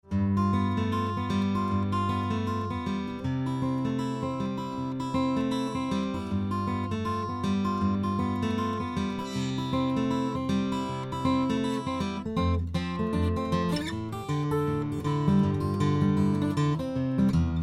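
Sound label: Taylor 615 acoustic guitar; 1992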